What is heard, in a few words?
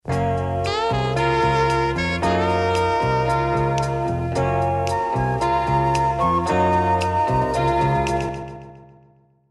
Sax Tono